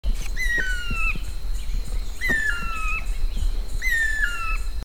Guira guira raptor

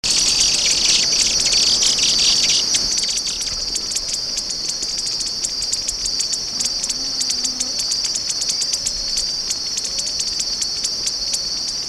Neothraupis fasciata

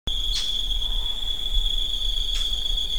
Conopophaga lineata

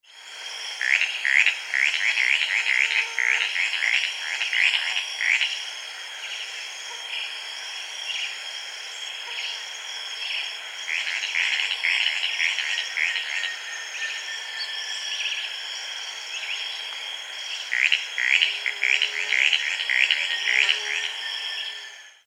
Tityra cayana; Ocampos

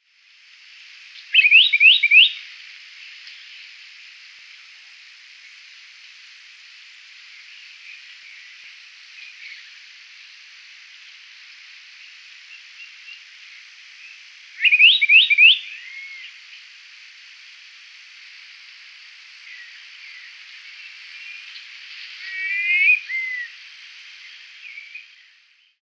Antilophia galeatus song; Aguaranu

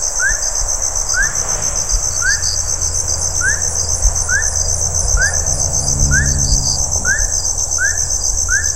Leptodactylus bufonius

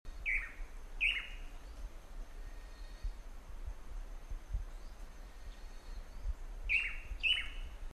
Chiroxiphia caudata; Chi-voo call; recorded Mbaracayú Forest Reserve, Departamento Canindeyú; Paul Smith October 2008

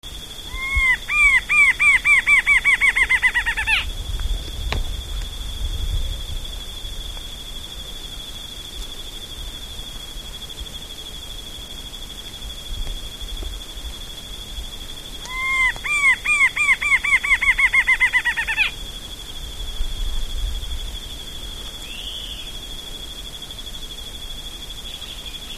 Thamnophilus torquatus

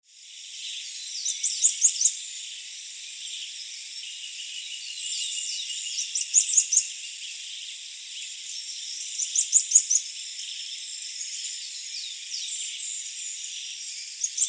Xenops rutilans, Streaked Xenops, Song; recorded Ocampos, PN San Rafael, Departamento Itapúa; Myriam Velázquez October 2001

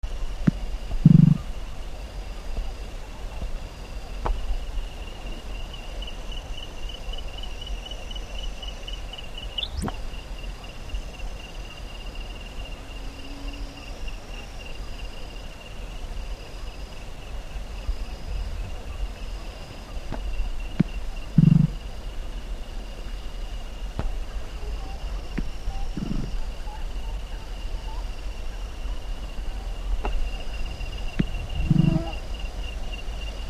Eleothreptus candicans

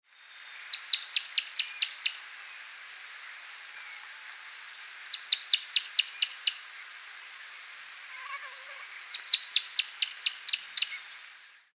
Anabates certhioides; Campo Mari

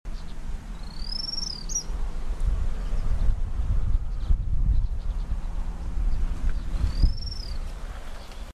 Inezia inornata

